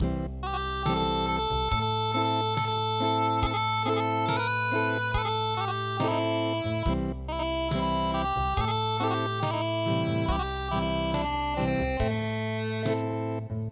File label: Acoustic; Touya Hiragun